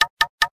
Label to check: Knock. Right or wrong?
Right